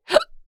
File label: Adult female hiccup